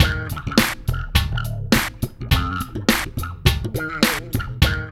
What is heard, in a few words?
LOOP